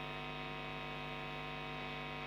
OD E Gtr

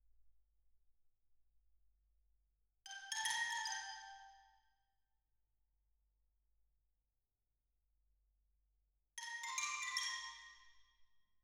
Twin R Xylo

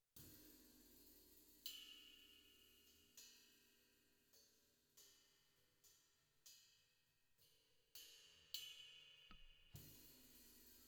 Floor

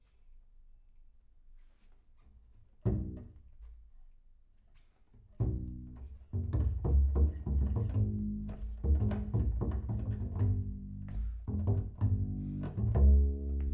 Bass Room

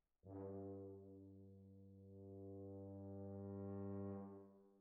Hall

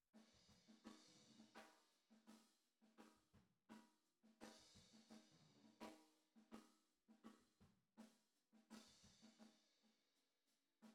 TB GTR

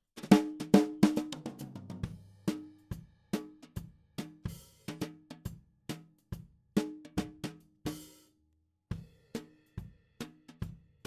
SNR top

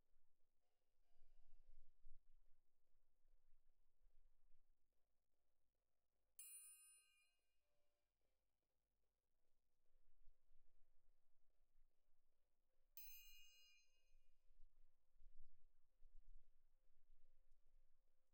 Perc Main

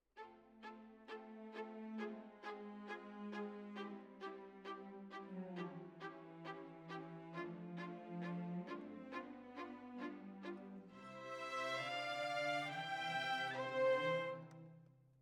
Violins, jazz for piano

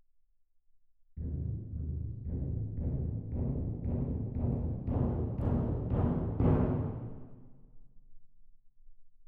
Perc

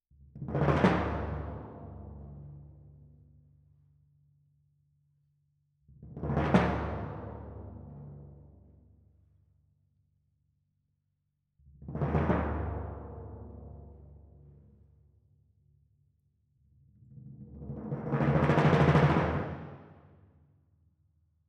Timp Main

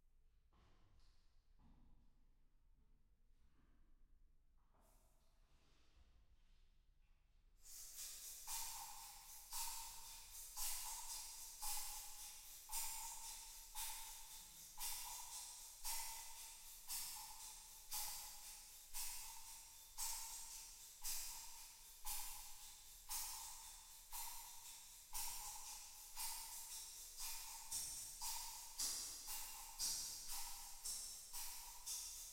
PCM 70 Shakers

